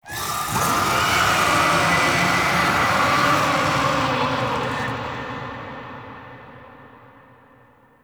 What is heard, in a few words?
Alarm Screams; Gameplay; Warframe; Zariman